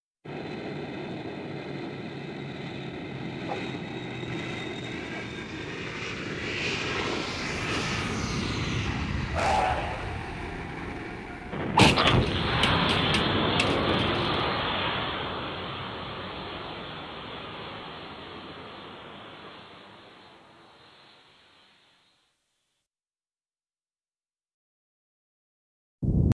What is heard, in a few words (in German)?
jet stürzt auf rollabahn; Katastrophen